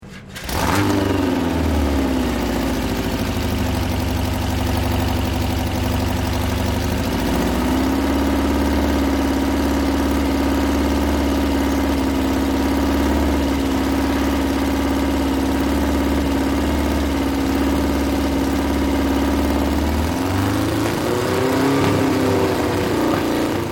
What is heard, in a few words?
VW Hot Rod Engine Long Sound Revs And Idle; Hot Rod VW, raw engine sound. Start, idle, drive around, stop.; LYN096 package of Hot Rod sounds; Car Sound Effects